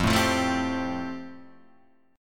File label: F Major Add 9th; Fadd9 Chord {1 0 3 2 1 3} strummed